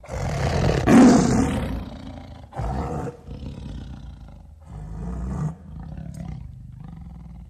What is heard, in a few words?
Tiger Roar Big, big cats tigers